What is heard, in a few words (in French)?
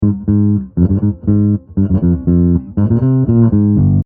basse